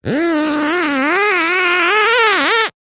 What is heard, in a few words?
industrial duct tape rub finger squeak